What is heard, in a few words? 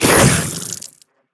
worm spit start, creatures